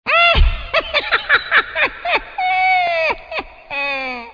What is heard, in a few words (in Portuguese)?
faca; cstrike; rocinha